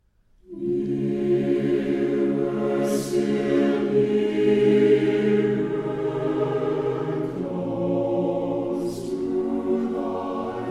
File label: Chorale